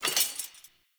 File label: Scrap Metal Pickup; Objects; Pickups